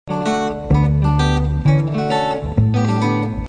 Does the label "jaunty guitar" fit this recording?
Yes